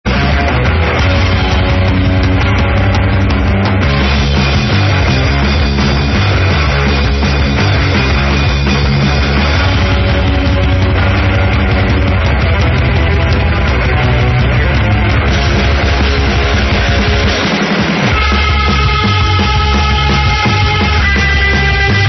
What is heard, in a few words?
BEAT